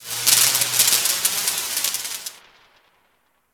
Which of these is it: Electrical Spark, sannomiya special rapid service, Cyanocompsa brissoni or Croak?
Electrical Spark